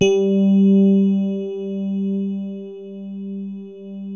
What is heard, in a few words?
DELICATE, DX RHODES; Best Service Advanced Media Trax Modern Composer